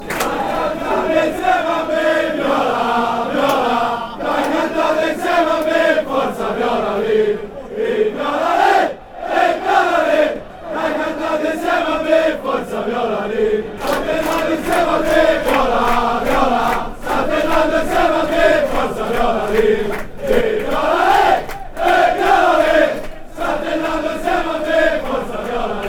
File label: viola viola, fanchants